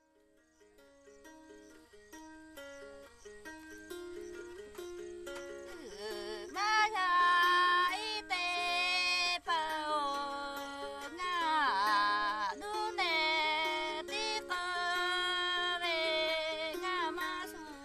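Red Lahu song, song with lute accompaniment the singer tells of missing her parents when moving to live with her husband; Lahu Nyi (Red Lahu)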